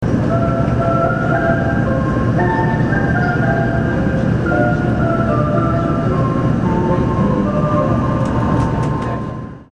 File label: chime